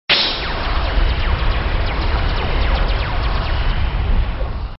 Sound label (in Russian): volshebnaya lazernaya pushka strelyayushaya neprerivnim zvukovim materialom